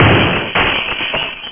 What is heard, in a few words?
shatter